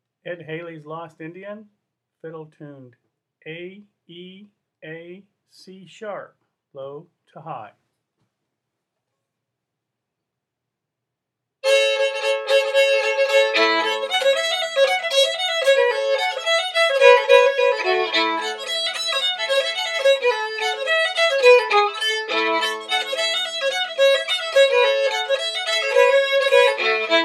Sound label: fiddle; festival tunes